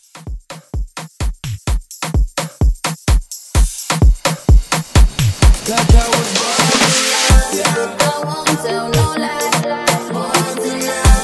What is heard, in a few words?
Afrobeat Remix 128